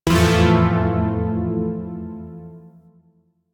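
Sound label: Shawzin Major Chord; Chord F; Chords; Warframe; Zariman Shawzin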